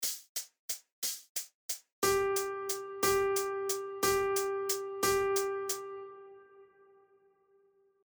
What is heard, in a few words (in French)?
Noire pointée Ternaire